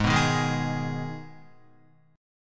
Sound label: Ab Minor Ab down Guitar; Abm strummed; JGuitar